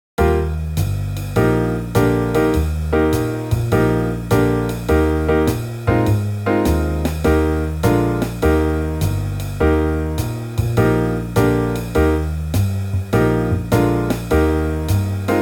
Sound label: Eb High Jump, brass